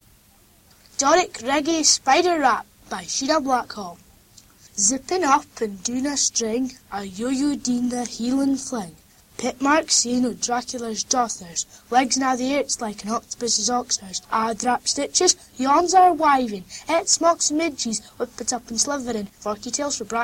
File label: Doric Reggae Spider Rap